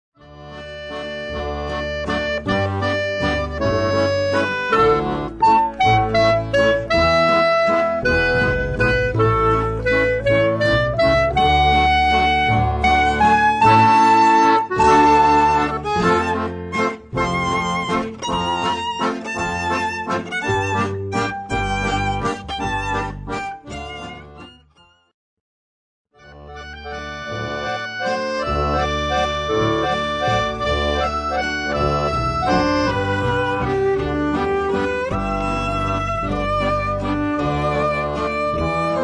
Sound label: Waltz Medley